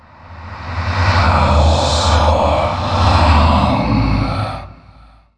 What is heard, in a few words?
incant; warlock